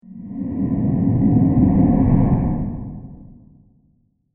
Slow Science Fiction Transition With A Breathy Touch; Crafted Signals